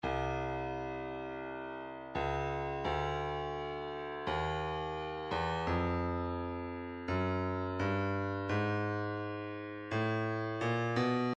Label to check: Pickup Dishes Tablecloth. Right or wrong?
Wrong